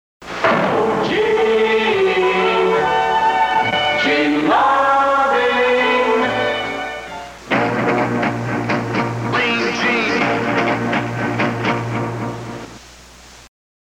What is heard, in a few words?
Jingles; Gene Loving Jingles